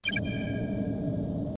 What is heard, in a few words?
boost beep; Omega boost